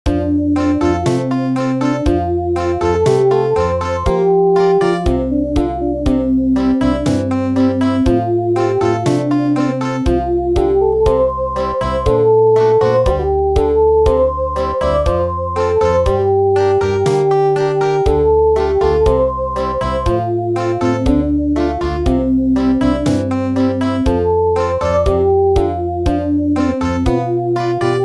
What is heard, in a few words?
Country